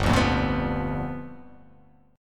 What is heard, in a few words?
G 7th Sharp 9th G